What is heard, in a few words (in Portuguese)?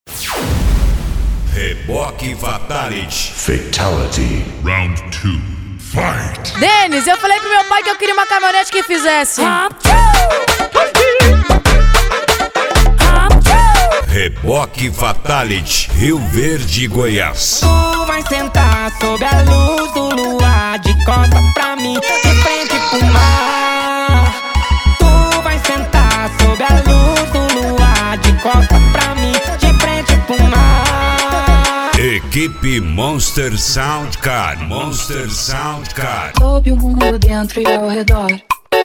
forro